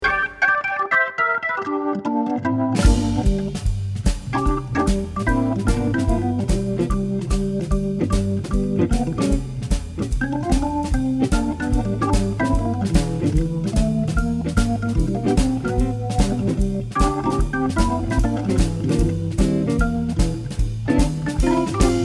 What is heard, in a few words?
Organ